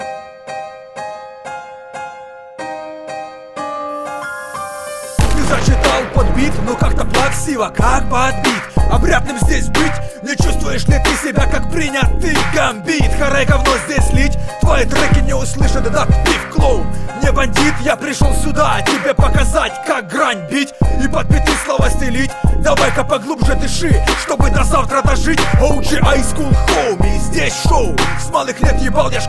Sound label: G Funk Style